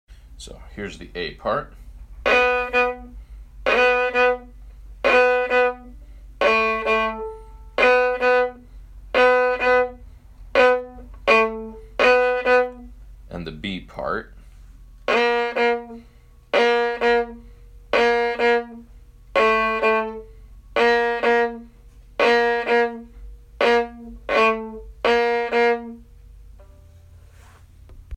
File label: kitchen jig groove 3rds low octave